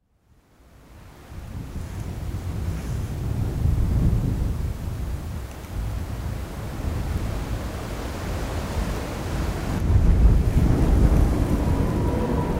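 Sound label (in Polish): odgłos wiejącego wiatru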